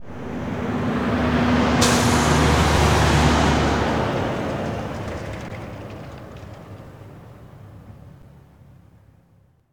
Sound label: Truck Semi Passby